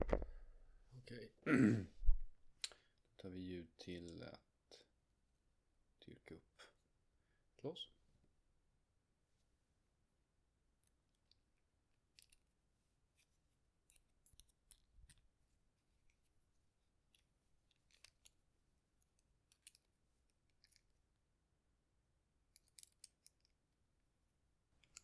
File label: lockpicking, Just me picking a lock; The first 13 seconds could probably be cropped out, though.